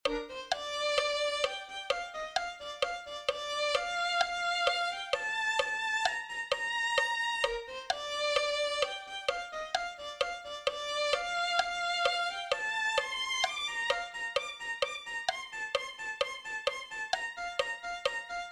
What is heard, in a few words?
violin